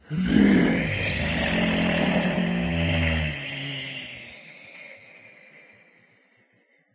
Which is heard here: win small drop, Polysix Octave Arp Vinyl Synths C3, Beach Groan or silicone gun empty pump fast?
Beach Groan